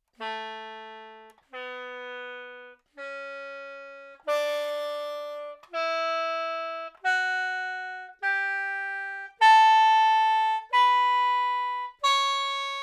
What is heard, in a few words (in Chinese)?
传统笙 音阶 速度慢 力度强